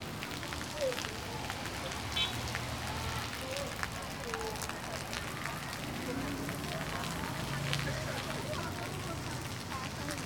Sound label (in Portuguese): Escoamento de agua depois da chuva rua com pessoas e transito